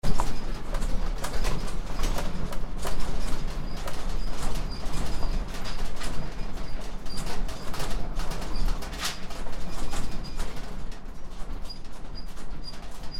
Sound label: quake; 地震; 久々の大きな地震．結構揺れた．